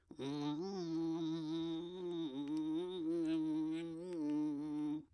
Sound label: Balloon Idle